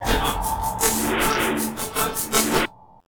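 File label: 78 BPM; RHYTHMLOOPS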